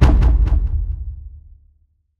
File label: strider steps lfe; creatures